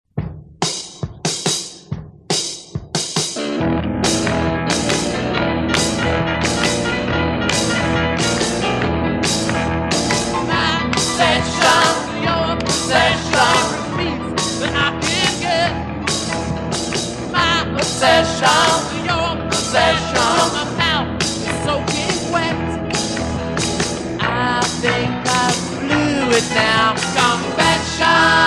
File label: drum set; INSTRUMENTOS MUSICAIS